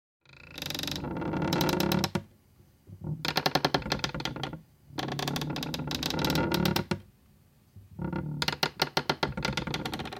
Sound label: Low Hinge Creaks On Secretary Desk, Predominantly Woo; Low Hinge Creaks On Secretary Desk, Predominantly Wooden Sounding.